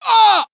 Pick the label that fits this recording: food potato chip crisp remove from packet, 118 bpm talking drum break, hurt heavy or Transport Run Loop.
hurt heavy